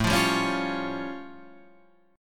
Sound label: A Augmented 7th A; x,12,15,12,13,12,15,x